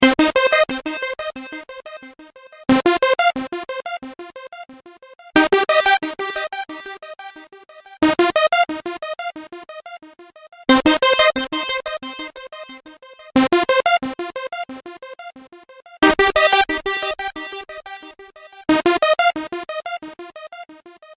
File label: Dj ring